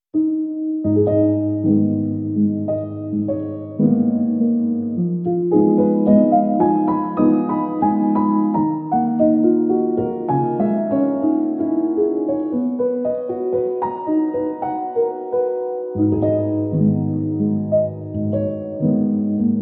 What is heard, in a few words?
Windy Roads, Period Drama Emotive Piano